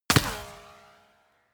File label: bullet small impact ricochet; krieg-waffen; geschoss